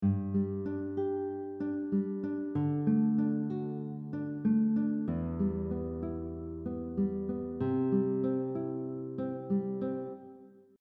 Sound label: Fingerpicking pattern mixing picking directions, guitar; we go up on beats 1 and 2 then mix up the directions on beats 3 and 4